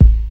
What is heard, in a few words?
warm kick drum sound A key, Royality free kick single hit tuned to the A note; kick drum samples